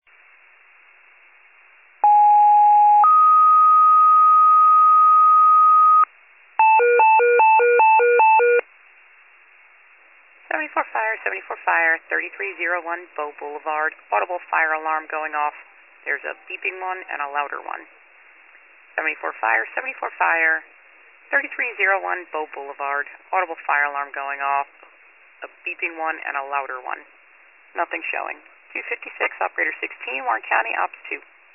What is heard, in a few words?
fire, pager alert